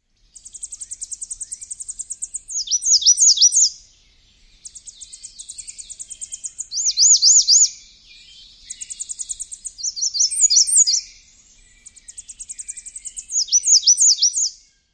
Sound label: dawn song; NY